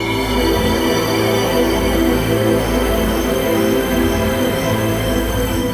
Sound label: Oceanic Pad min; Keys; Pads; Drum & Bass